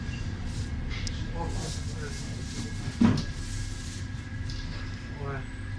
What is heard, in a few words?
ambiance, Metro